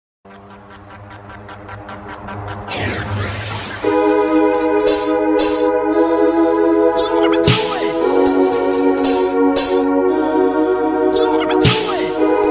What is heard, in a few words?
Korg Pa 900 italodisco remix; korgstyle